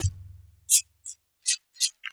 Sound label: Cellar Beat, Acoustic Beats; Sonic Foundry (Sony Creative Software); Myths of Technology